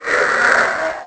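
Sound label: scream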